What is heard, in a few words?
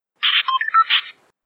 radio alert; cstrike; pubg